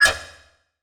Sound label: Transition Hit Impact Short